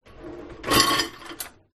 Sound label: dishes in dishwasher push in